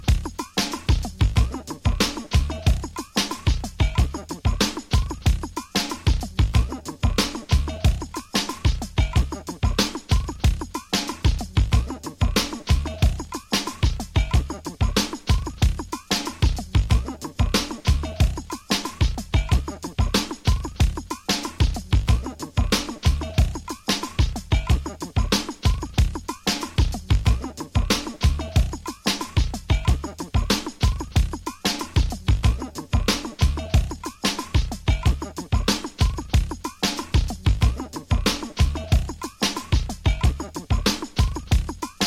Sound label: drums